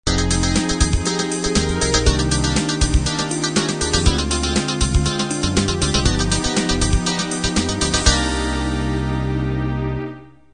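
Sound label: standard 16 beat end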